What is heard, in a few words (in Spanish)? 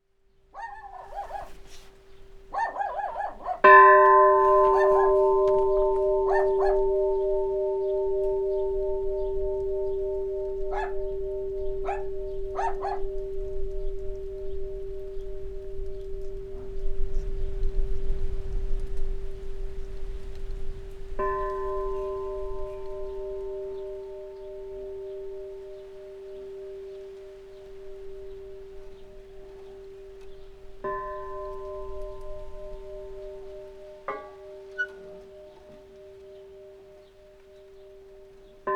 Manipulacion Campanas de Boiro; Mapa Sonoro De Ibias